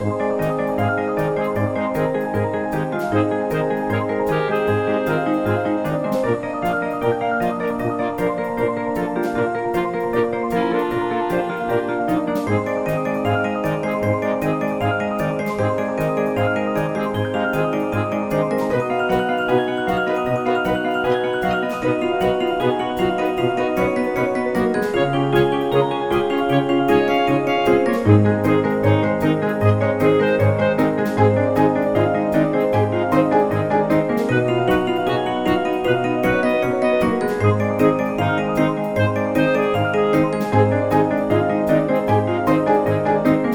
Driving Minigame; peppa pig world adventures

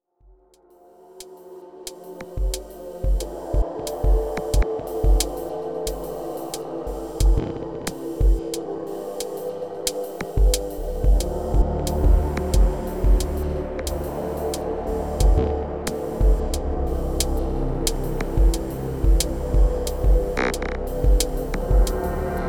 Rain and Gravel

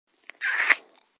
Kiss

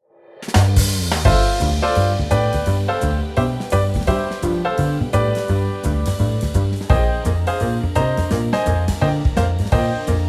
BGM BATTLE; KEYMAN

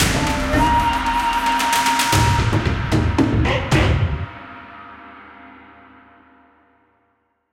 Enso Sushi (Transition); Cooking Dash

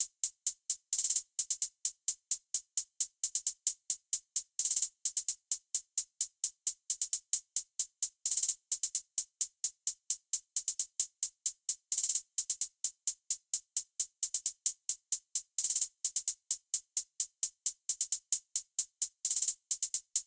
Hihat Only; Unspottable; Xbox Series X|S

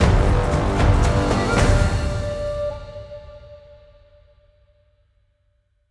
Music Boss Fight Theme Loop End; Frozen Flame

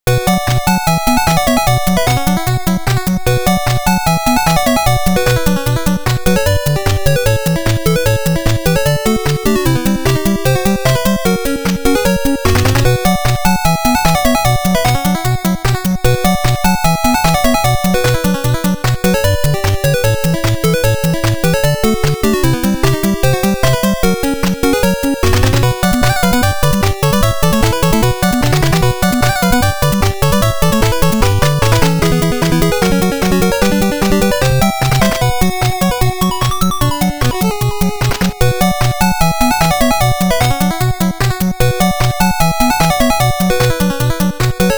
Forest BGM - Tempo Up; Konami Famicom Chronicle Vol.3; ROM Cassette Edition

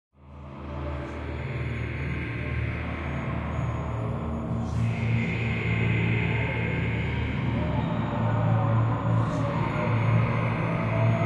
Crash; Outlast 2

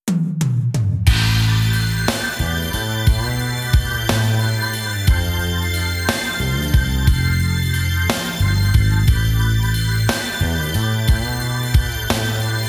Karaoke

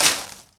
Plant Collision; Angry Birds Rio

